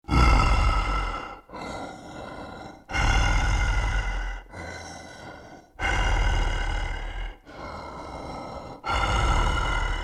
Monster Horror Heavy Breathing Guttural Raspy, Scary Breathing; Horror Scary Evil Dark Ominous